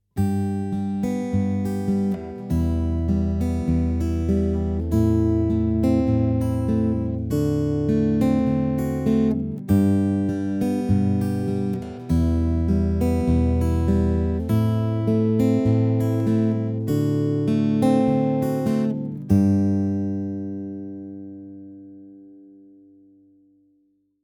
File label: Folk, begleitung; Folkpicking 1; Begleitgitarre